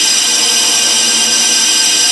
A#4 VAPOR; Northstar; The Wizard VOL-1

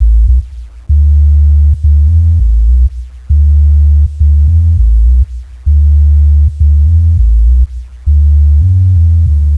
Deep Bass

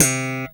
BRITE BS C4 POP, FINGER BS

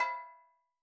Steel Drums Layer B5, Calypso Steel Drum; Percussion

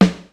Snare Drum, Yamaha DD-35

